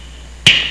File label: key